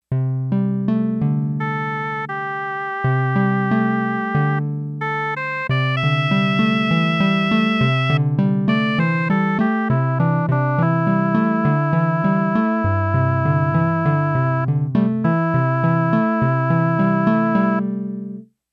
Yamaha DX11 Factory Performance Voices Lyric Split